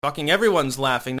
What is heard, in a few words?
everyones laughing short; styxhexenhammer666